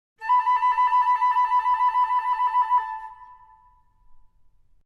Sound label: Flute Link; Ren & Stimpy